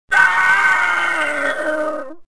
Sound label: death; Star Wars Jedi Knight II Jedi Outcast; XBOX; reelo